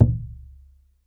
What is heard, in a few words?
PUEBLO DR, American Natives; E-MU Formula 4000 Series Vol. 4; Earth Tones